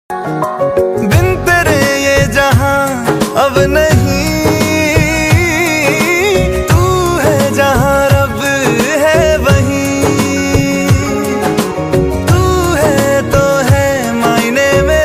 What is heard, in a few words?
lofi beat song